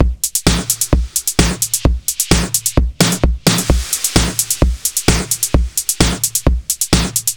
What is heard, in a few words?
Beat Quicken; Zero-G; Future Wave; Beats